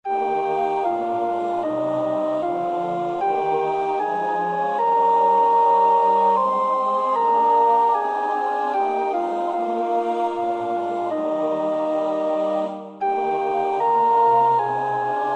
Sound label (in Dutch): sopraan, koorpartij; Bach (Johann Sebastiaan); BWV 159; Jesu, deine Passion